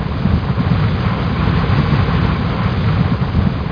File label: wind, ambience; Quake; PainKeep